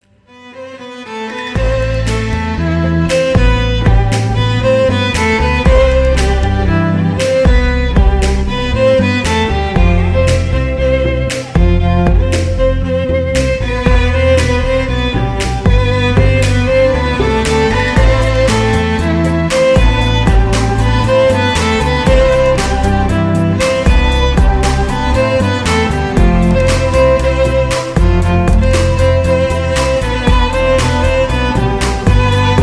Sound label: violin cello bass cover